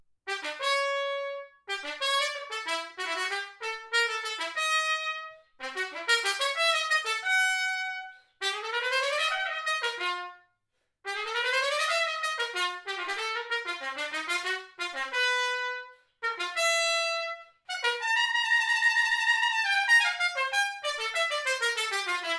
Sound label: Bb all brass